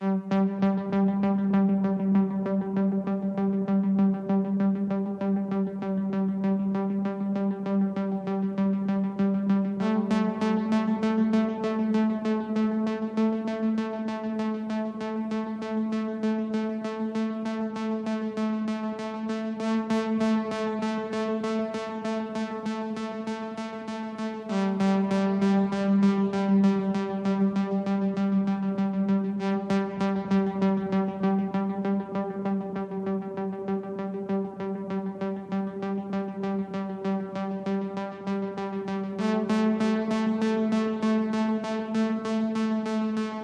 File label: Pulse